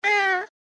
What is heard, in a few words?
Distressed cat meow chirp; animal; gfxsounds